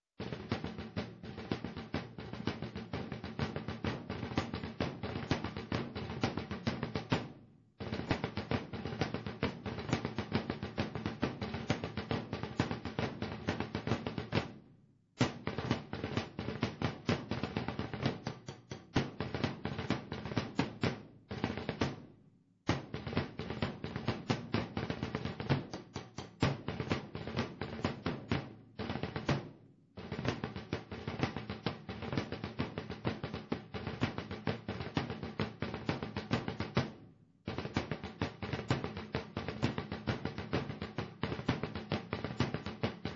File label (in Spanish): jota, tambores; La jota; SAMPER